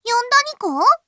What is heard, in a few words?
reloading; niconi